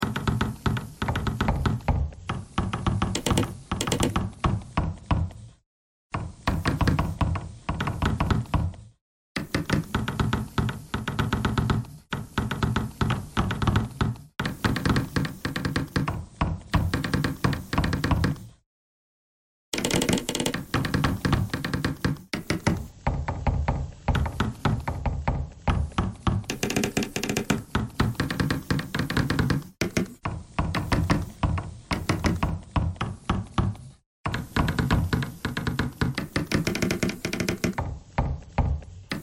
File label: bagpipe thread noise